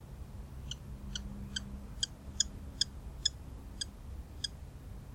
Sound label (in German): turmfalke